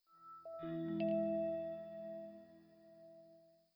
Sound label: Shutdown; OS5 Warp 1.0; Windows Never Released Soundpack 2; deviantart